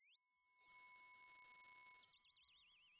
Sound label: Sound of a tea kettle whistling